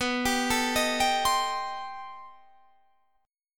B 7th Sharp 5th Flat 9th, guitar

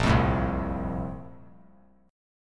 Bb Major 7th Suspended 2nd; BbM7sus2 strummed; JGuitar

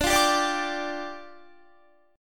D Minor Double Flat 5th, down, Guitar; Dmbb5; strummed

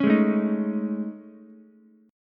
A Major 7th Flat 5th, Ukulele; AM7b5 strummed; JGuitar

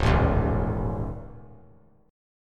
Db Suspended 2nd Sharp 5th; strummed; Dbsus2#5; JGuitar

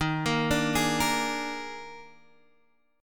D sharp Major 7th Suspended 4th, Guitar, Standard; arpeggiated; x,6,8,7,9,6; JGuitar

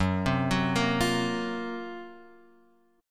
F sharp Major 7th Flat 5th, Guitar, Open F; arpeggiated; JGuitar

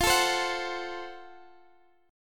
F sharp Minor Major 7th Flat 5th F

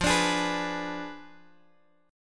F Diminished 7th F down Guitar DADGAD

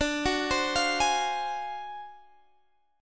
D 7th Sharp 9th Flat 5th, Guitar Standard; 22,21,22,22,21,x; arpeggiated; JGuitar

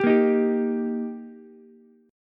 G Minor Sharp 5th G Ukulele, chord; strummed; JGuitar

